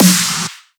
bigroom snare

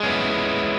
Arpeggio down C#m7

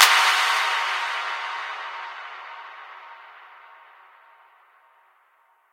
fx clap

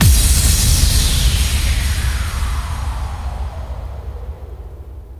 soft hit finish

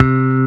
bass

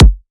soft hit whistle

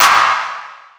Shut the door again

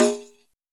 Tak

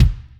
Drum

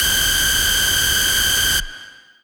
lead

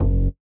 bass F#2

